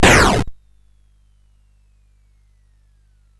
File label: Frantic, CSG8580R5